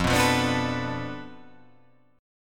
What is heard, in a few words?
F 6th Flat 5th F down, chord